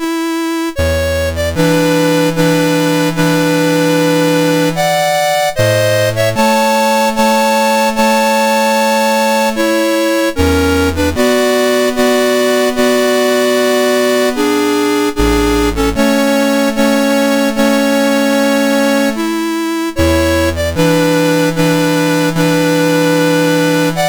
Prelude in A Major, MOS6581R4; Op28 No7

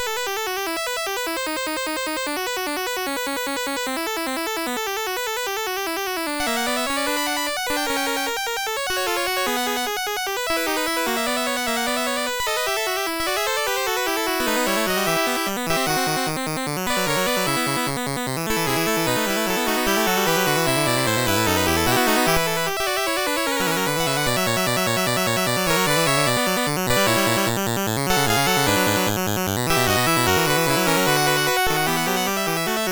Fugue, MOS6581R3; Fugue Fourth Movement

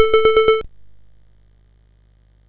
sid, MOS6581R4; Classic Concentration

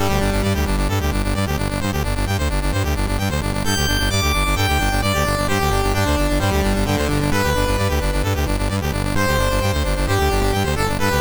sid MOS6581R3; Games Summer Edition menus

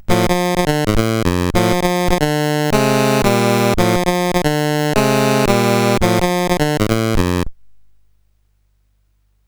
sid, CSG8580R5; Blueprint

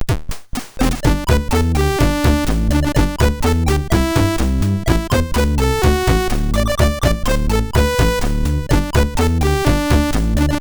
Jingle Bells, MOS6581R4

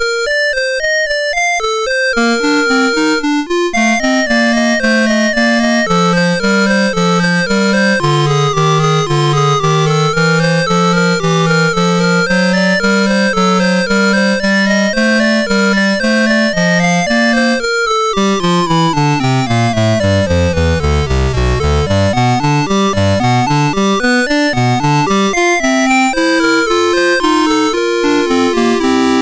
Prelude and Fugue in B Flat Major, MOS6581R3

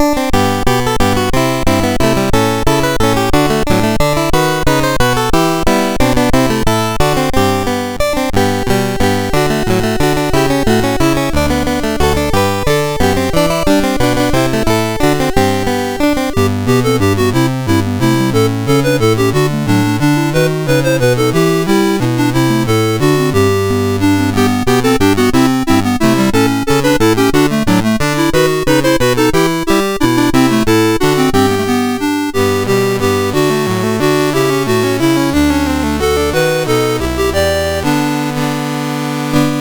We wish you a Merry Christmas, MOS6581R4

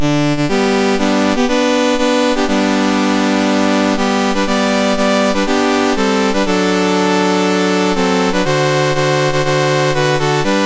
Papua New Guinea, National Anthems, MOS 6581; MOS6581R3

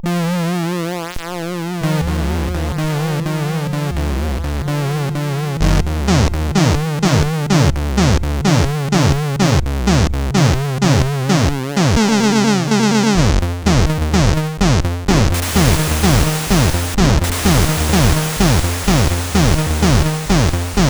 Techno Rythm, sid CSG8580R5

